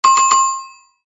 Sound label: Gameshow Bell Ding